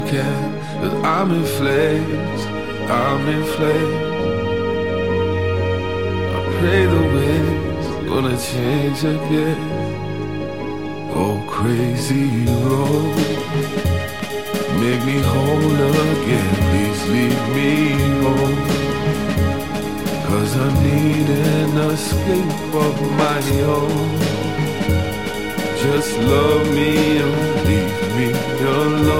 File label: jazz